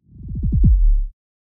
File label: FX